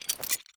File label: Zipline Release